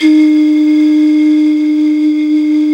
FLT BOTTL, Bottle Flt; Club-50 - Foundations Roland; Flutes +

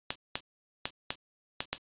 NRG Cymbal

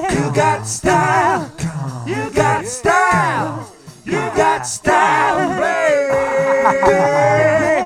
VOX L, Roots Disco; USB Soundscan vol.32; AKAI

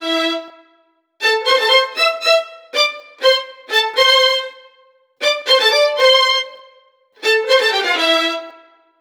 STRING LICKS, Disco; Best Service ProSamples vol.48 - Disco Fever; AKAI

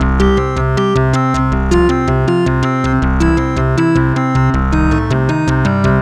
Bass, Synth Bass Loops

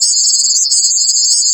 SFX, Drum & Bass 160 BPM; Ueberschall; Drum & Bass Flash Trackz

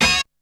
Hit; ZERO G - Datafile DISC 2

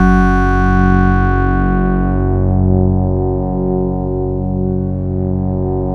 SYN PPG; Sound & Vision - Gigapack I CD 2

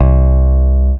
E bass (C); Sonic Foundry (Sony Creative Software); Ugly Remnants VOL-2; Basstuff

